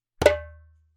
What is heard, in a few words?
djembe; djembebog oevelse